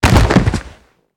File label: bridge hard land; Original TK Sounds